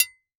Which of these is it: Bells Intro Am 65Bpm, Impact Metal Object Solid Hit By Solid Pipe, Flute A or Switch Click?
Impact Metal Object Solid Hit By Solid Pipe